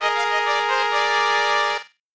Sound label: Broken Weapon